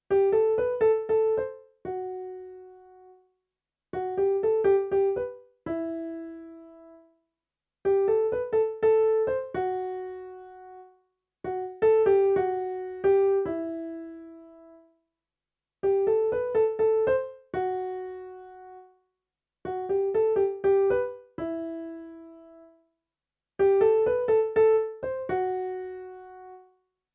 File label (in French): piano